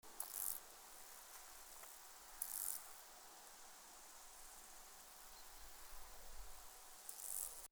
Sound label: Chorthippus albomarginatus; Wienerwald